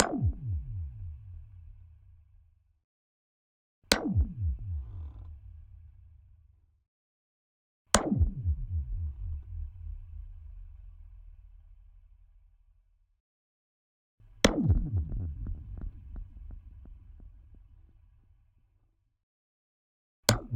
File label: Contact Mic, Cable Rope Stays, Percussive, Yacht & Marina; Rogue Waves